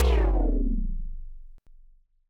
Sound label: TD-20 Tom B, Roland TD-20 Toms